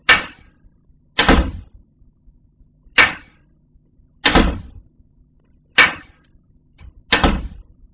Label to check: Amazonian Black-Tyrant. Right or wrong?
Wrong